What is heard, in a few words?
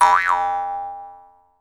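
Jaw Harps, Pitched Percussion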